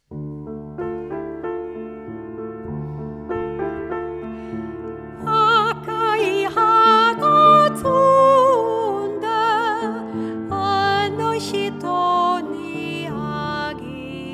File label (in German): mit Gesang; Akai hana